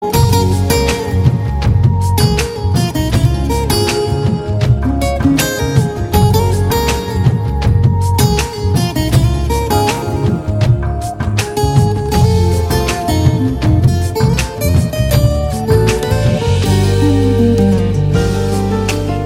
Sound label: Gitar